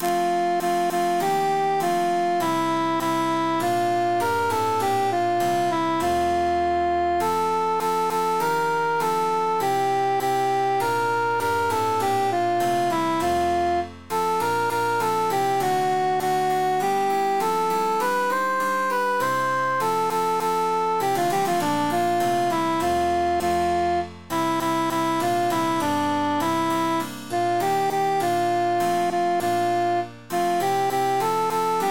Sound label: cantus emphasized; matona mia cara